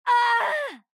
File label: Scream; Symmetra; overwatch